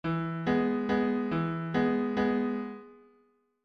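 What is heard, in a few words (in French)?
accomp mini pompes mi majeur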